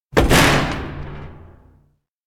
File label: door toilet open fast; anemoi